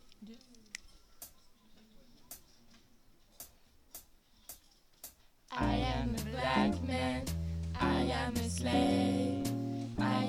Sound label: blues